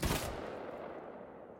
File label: Medium Weapon Shot; Invector 3rd Person Controller; Shooter